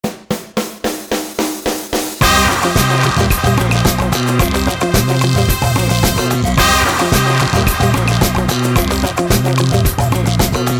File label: Brazilian Disco